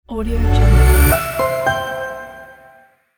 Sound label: media intro logo